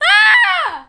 fall; Messiah

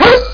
KICK; CManual-3.0

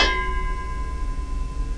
Ting; Réflexion; Mosaïcon

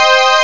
chord

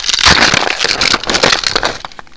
Landslide!!!

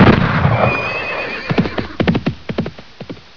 poker openup; Boxcars Casino

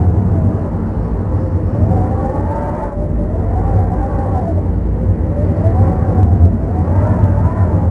ambient; Jump Zampoli

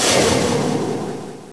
shuriken; aggress

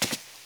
ladder step; wob2 demo

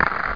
dice; senet; nile

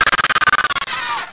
WASP DIE

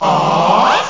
ah

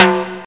timbale hi; Amiga Special - Software Hits; orchestra; drum

